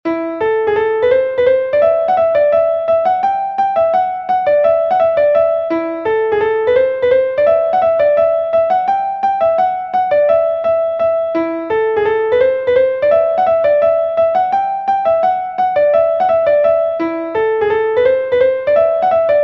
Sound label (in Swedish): Schottis datorgenererad från noterna; Gubben Garbergs; Gravbergarn